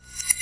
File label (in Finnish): kilistin, Clangy Crunchy Foundsound Kit